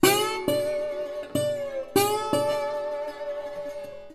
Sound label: Blues Guitar Samples; ILIO - Fretworks